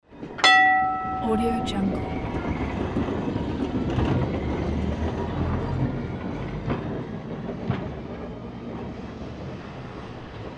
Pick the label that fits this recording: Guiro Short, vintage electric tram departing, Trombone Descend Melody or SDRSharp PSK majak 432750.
vintage electric tram departing